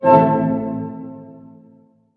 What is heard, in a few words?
HARP HITS A MIN, Orchestra; Sound On Sound - Peter Siedleczek's Orchestra